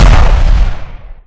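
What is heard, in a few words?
explosion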